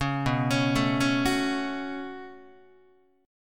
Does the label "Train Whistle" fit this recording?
No